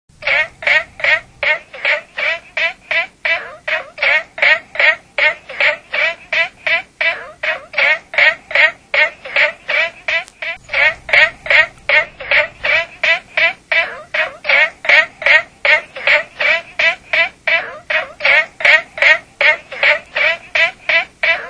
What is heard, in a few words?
Rana adenopleura